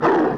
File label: WOLF; THE REALM